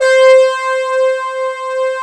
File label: C5, Synth; FRENCHY